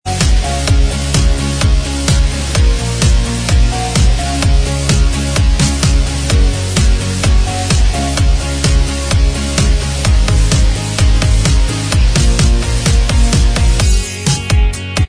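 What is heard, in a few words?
instrumental, backing track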